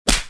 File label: miss; mine